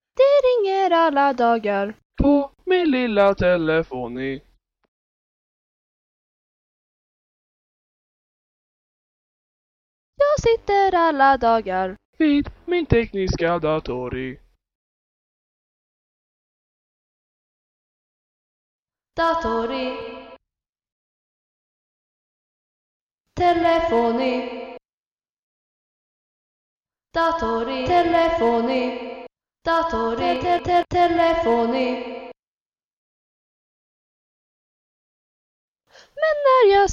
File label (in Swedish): dator i telefoni accapella